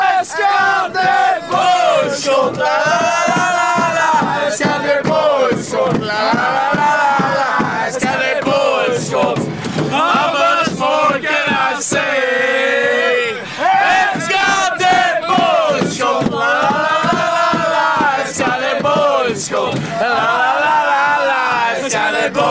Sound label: lalalala fanchants; skd booischot